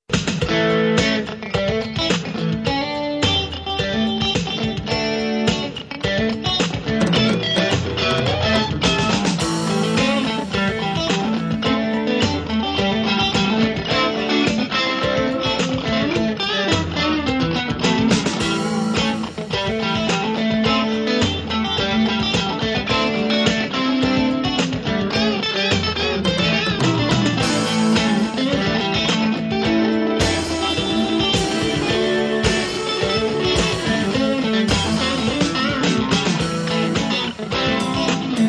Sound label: funky